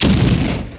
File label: explosion nitro; Crazy Machines; Играет вся семья